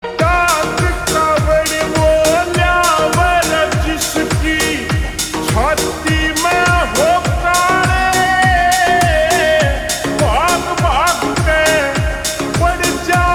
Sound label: Slow Reverb Version Ringtone